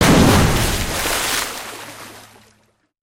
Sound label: water splash close; destructibles